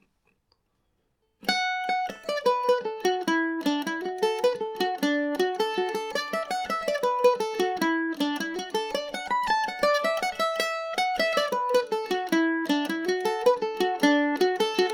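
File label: E dorian, Irish mandolin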